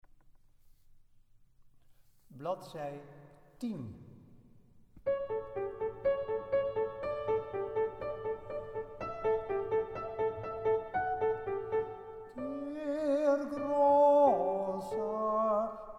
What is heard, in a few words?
Tenor; BWV133